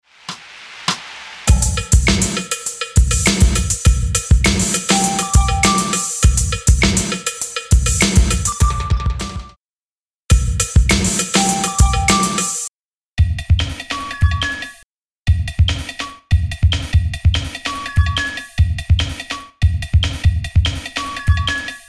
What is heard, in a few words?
Drum And Bass Beat